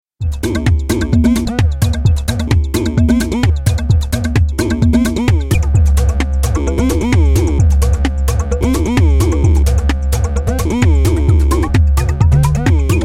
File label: Drum Machine Dance; Dance influenced loops; Tassman4; Electronic Toolkit